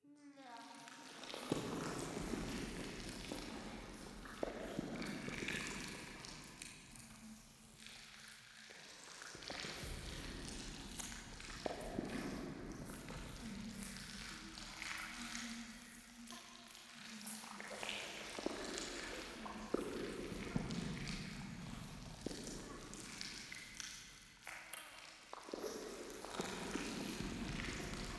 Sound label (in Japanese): フェラチオ 触手